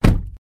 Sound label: Door